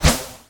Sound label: medieval scythian recurve arrow heavy pass by; still north media